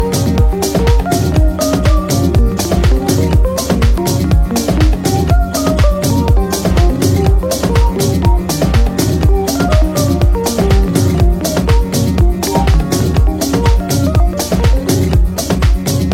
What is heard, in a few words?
Ringtone; Samsung Galaxy A52